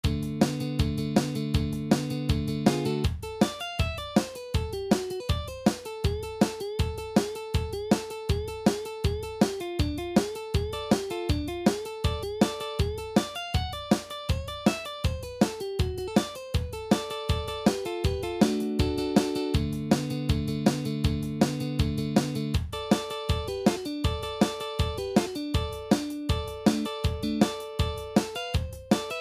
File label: Style Solos